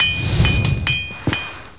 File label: blacksmith; war2; humans buildings